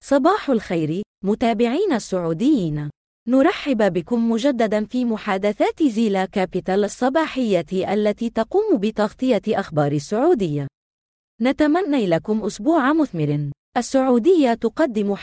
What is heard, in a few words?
Arabic Kingdom Pulse